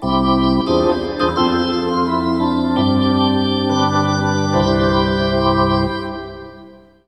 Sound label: Hockey Organ Oh Canada